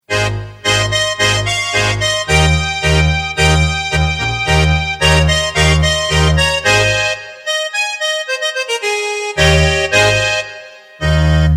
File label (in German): Tango